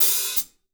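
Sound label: hat open close, drum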